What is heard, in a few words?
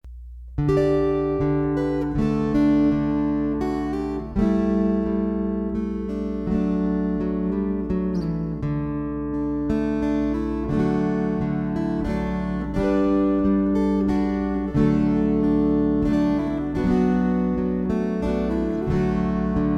Waltzes